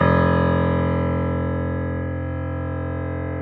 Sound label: PF F0, G.PIANO; AKAI S-Series CD-ROM Sound Library VOL-7; G.PIANO 1